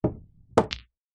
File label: sport snooker pool junior pot ball into pocket; zapsplat